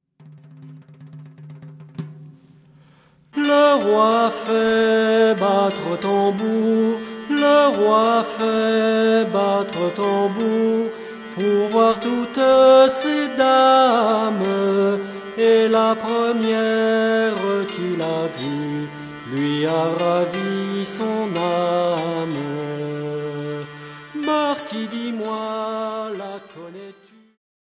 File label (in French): roi tambour